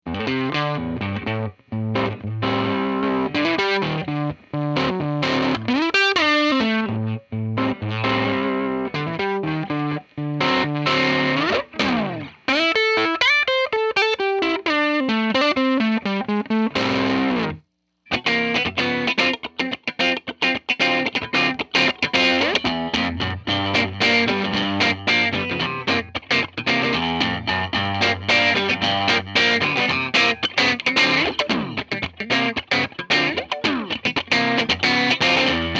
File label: Switching Boost